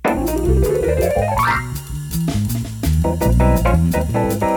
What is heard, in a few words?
free jazz